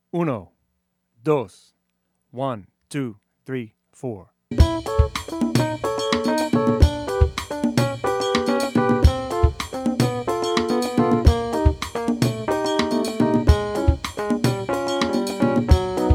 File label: Rhythm Etude Latin no bass